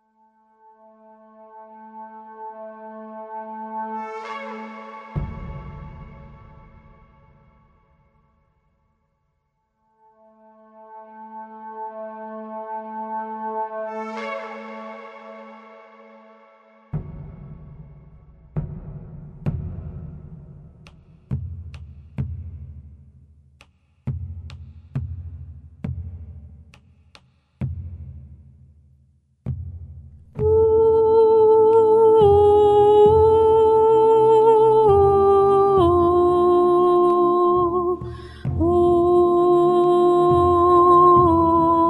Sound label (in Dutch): Kyrie sopraan